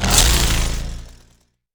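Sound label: attack chainsaw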